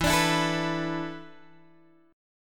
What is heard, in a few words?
E 7th Suspended 4th, Guitar, Open G